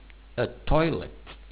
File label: toilet